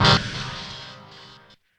CHORD, Drum & Bass 175 BPM; Ueberschall; Drum & Bass Flash Trackz

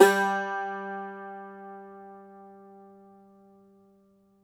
cumbus saz G, plucked strings

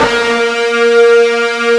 FUZZ A#2, Guitar; ULT FZZ LOOP; East West; Steve Stevens Guitar Samples Collection

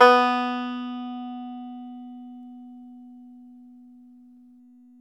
ARCHTOP, Arch Top Banjo

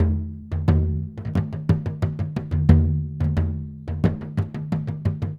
Bodhran, Celtic; Sonic Foundry (Sony Creative Software); Continental Drift Aftershocks

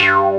TRANS X BS; Pro-Rec - Synthsonic; TRINITY

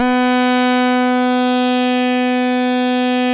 SAW RES DRY, SYNTH

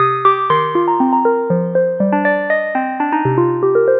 Dark Tine, Synth Textures; Sonic Foundry (Sony Creative Software); The Electronic Music Manuscript Collection

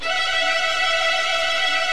VIO MAJ TRIL

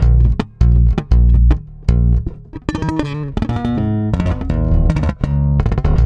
AL STRUM C, bass; Spectrasonics; Bass Legends; 88 STRUMMIN

